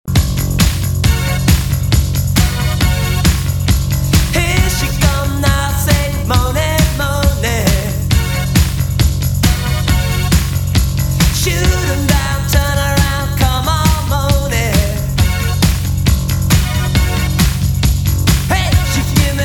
Classic 80s Re Drum

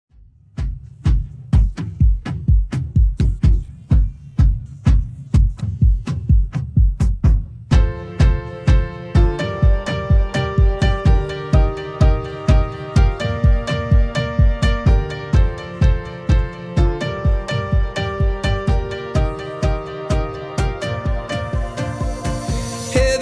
Extended Re Drum 126 Bpm

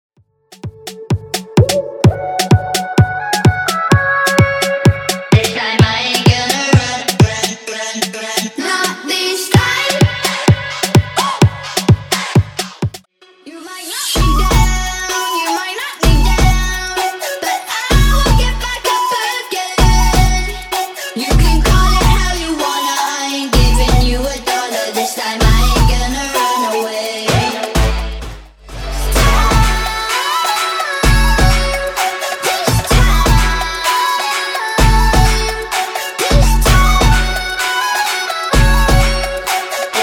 Bootleg House 128bpm

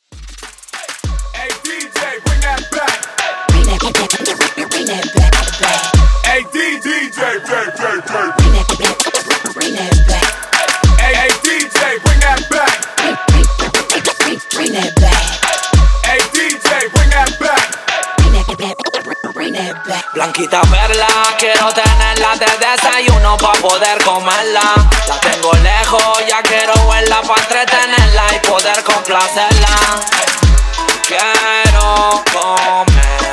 Latin Hip Hop Beat Break Hype In Outro 98 BPM

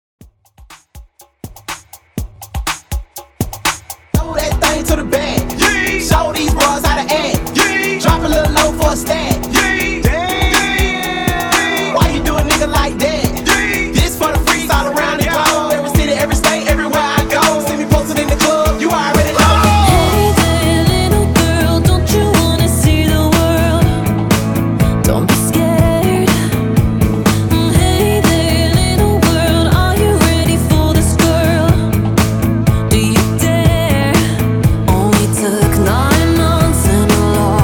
HYPE REDRUM, 122 BPM

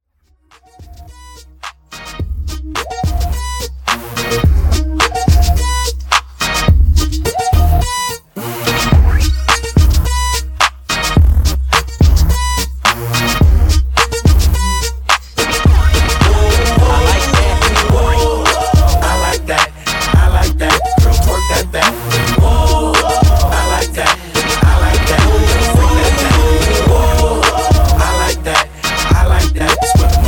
Intro Outro 107Bpm

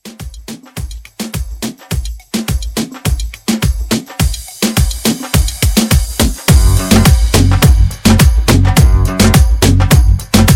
Moomba Re Drum

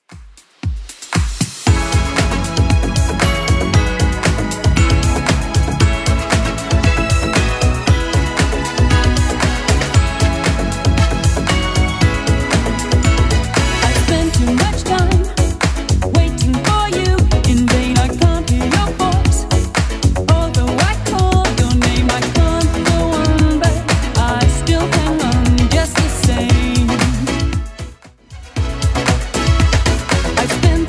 80s Club 116 bpm CLEAN

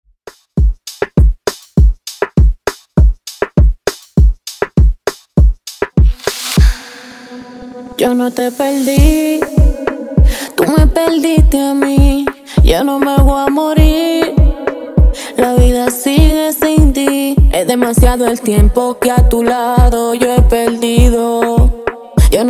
Drumapella intro Remix

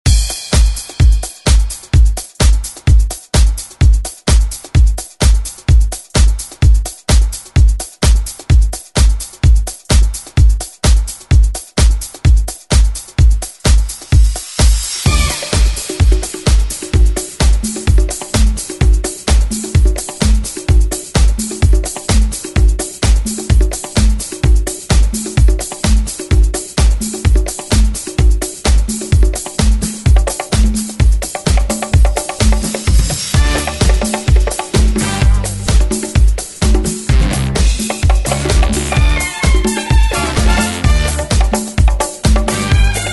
70s; ReDrum

